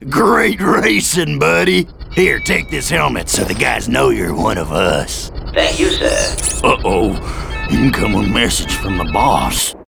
Cutscene - Hoverbike Race Victory

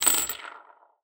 Loot Coins Appear

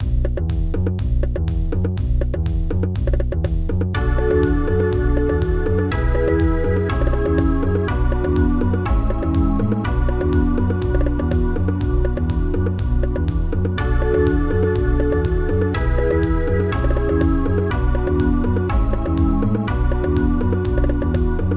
Sub Menu, snes; sansara naga 2 1994

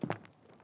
Step

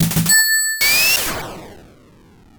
Retry Jingle; Prey

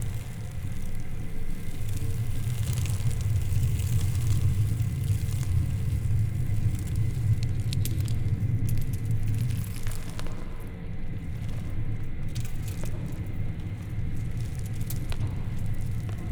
Ambience underground

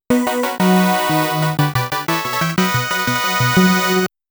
Clear Music; The Tower of Druaga

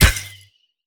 archer shoot arrow

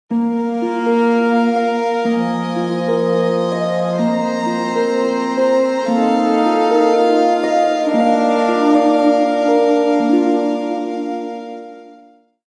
Ancient Calm; Civilization Revolution

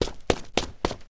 footsteps Temple; gamerip; temple run

